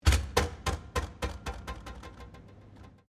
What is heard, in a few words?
SHELF COLLAPSABLE DROP, Fold up shelf in supermarket deli; Drops; Objects